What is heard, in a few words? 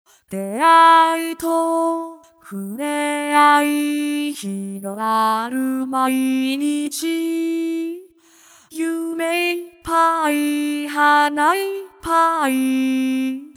vocaloid